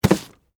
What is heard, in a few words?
Cardboard Box Grab, Foley, Cardboard, Box, Grab; BlastWave